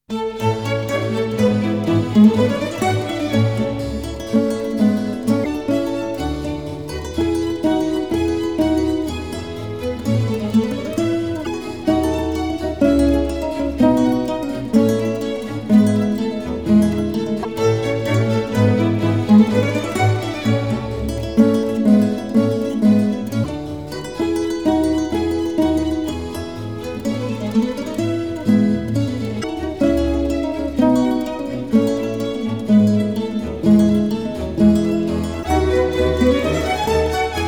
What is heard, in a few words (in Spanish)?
Concierto en La Mayor Allegro arr for G